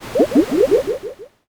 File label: poison